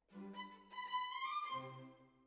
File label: Violins, jazz for piano